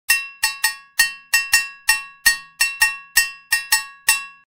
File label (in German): Kenken; Instrumente